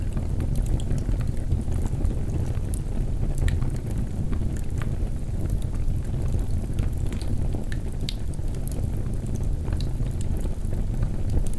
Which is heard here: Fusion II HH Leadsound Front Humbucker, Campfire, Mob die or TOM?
Campfire